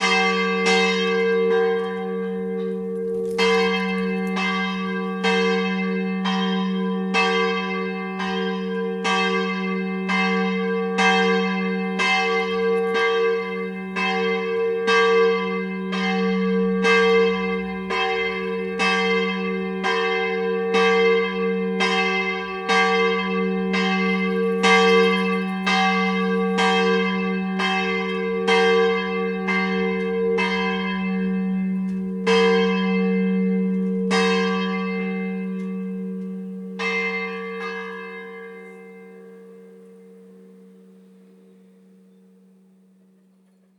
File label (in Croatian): ZVONA